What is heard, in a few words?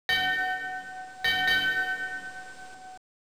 voicehole bpm130, drumloops